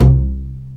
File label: STR Cb Pizzicato; Roland L-CD702; Cb Pizz 3